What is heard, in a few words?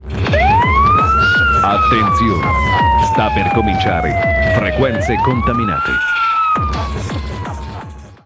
Jingle; FrequenzeContaminate